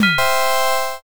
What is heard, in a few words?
impact